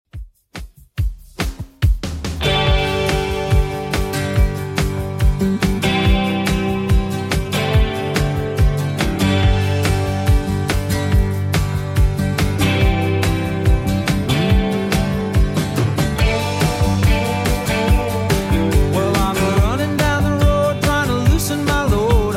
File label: 70s Redrum 142bpm